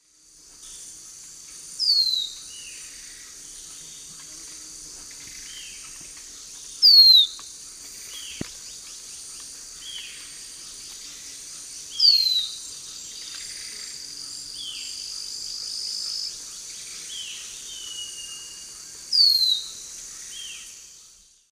Pithys albifrons, song; S. America; Eastern Lowlands

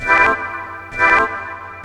Synth, Techno

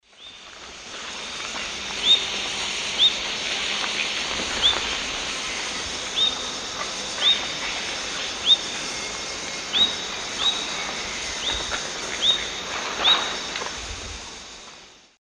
Leucopternis albicollis, flight calls; S. America; Eastern Lowlands

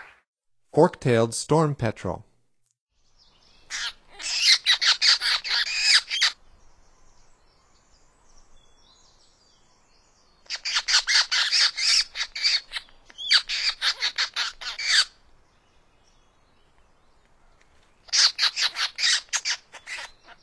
Fork tailed Storm Petrel; North America; Stokes

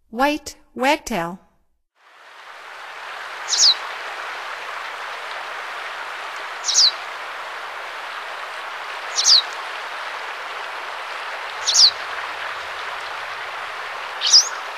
White Wagtail, Songs of Birds of North America; Stokes

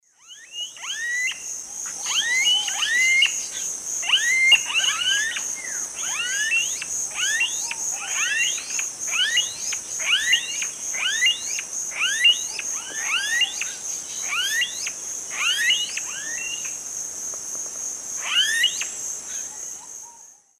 Crotophaga ani, calls; S. America; Eastern Lowlands

